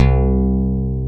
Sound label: C-1 PICK, BASS; AKAI S-Series CD-ROM Sound Library VOL-8; SET#1 BASS